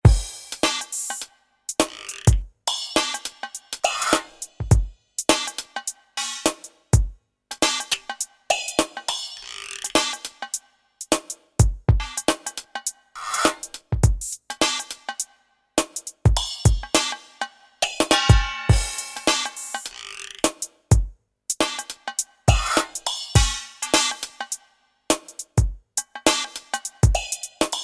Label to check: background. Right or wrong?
Right